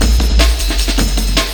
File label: Breaks, Jungle; Johnny Amen